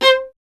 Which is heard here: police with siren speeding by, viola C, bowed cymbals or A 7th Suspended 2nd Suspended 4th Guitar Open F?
viola C